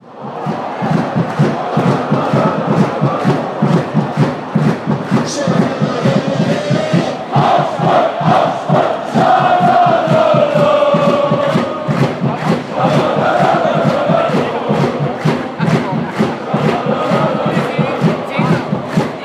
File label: Supporters